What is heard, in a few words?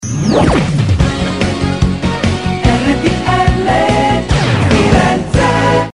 jingle; rtl firenze